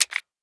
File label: p250 clip out, weapons; cstrike; csgo